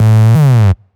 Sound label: Synth Shots; Vengeance Samples